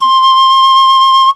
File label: BAMB VIB, BAMBOOFLT, Asian Traditions; Soundscan vol.60; AKAI